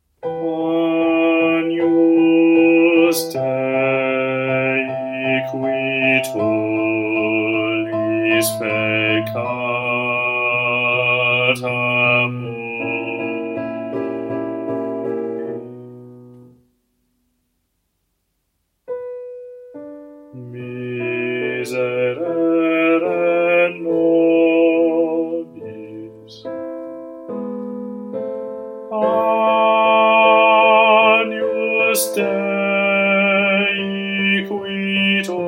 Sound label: Bass; Agnus Dei